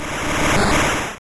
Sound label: Growth; Pokemon; Gen 1; attack moves; RBY